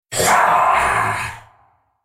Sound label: Droid spellcast effort